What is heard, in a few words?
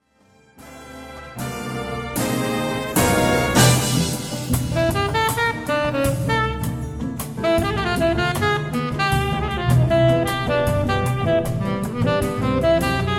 Tenor, Sepia; Gottsu; Sepia Tone